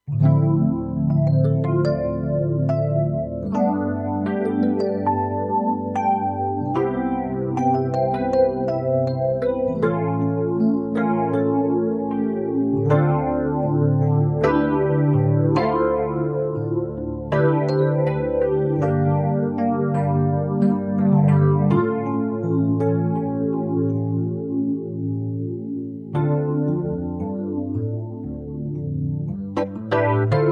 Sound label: Rhodes FX Chain